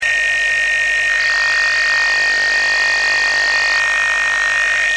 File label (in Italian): dcf audio disturbato, dcf77